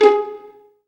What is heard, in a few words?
STR PIZZ, STR Pizzicato; Sound & Vision; Gigapack I CD 2; Orchestral